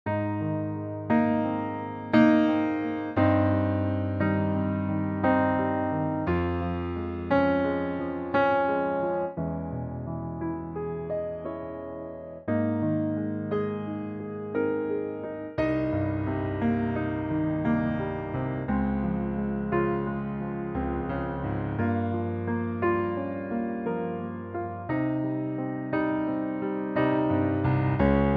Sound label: Ab piano; 9/8; Pianotrak; key - Ab - vocal range - C to Db (optional Eb top note); Gorgeous piano only arrangement of the big song from the musical "Man From La Manc